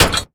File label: metal sliding door close; Oculus